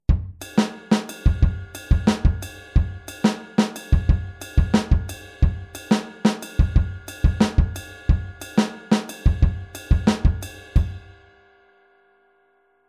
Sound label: Groove off